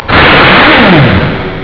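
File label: AIR WRENCH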